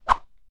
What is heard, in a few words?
giz Woosh; Undertaker's 2017 gamerip PC